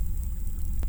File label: Hydrophone